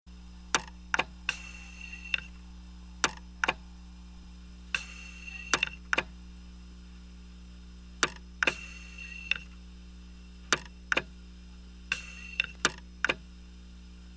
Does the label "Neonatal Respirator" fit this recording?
Yes